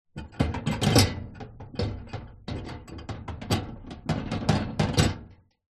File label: household microwave oven glass turntable place inside movements